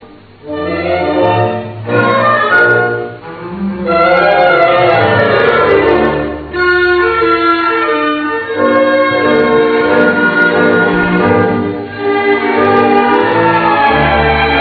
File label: tango; friedrichshoefe